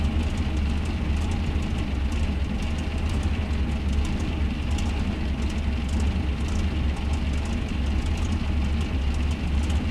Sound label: maus engine rpm, engines tanks; War Thunder